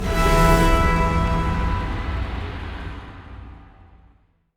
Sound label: Organ Chord Note E; Polluter Organ